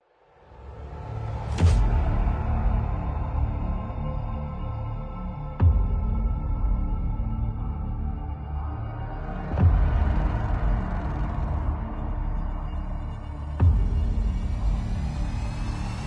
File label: horror